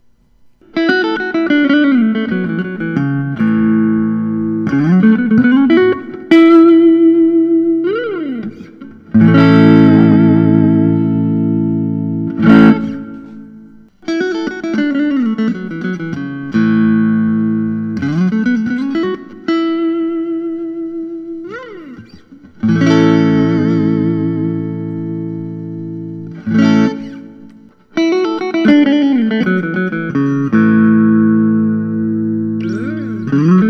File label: suhr alt t select maple top tea burst; trem 2020